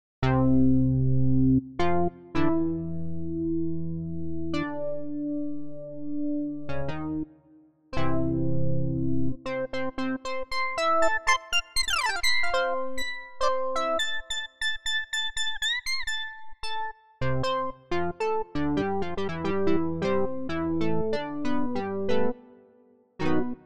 Ultra Analog

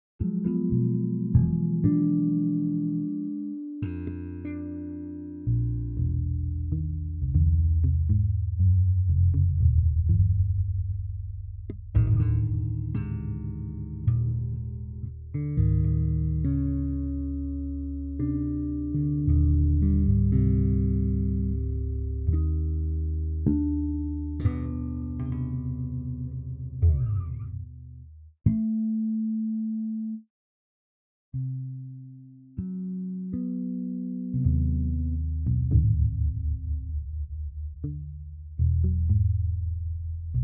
Electric Bass

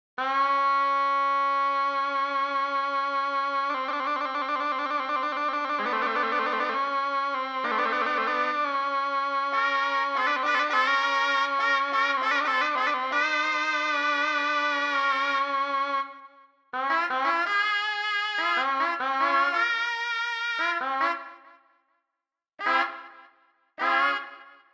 World Instruments

